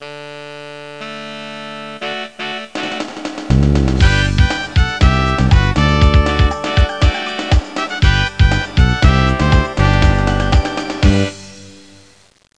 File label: Intro Tune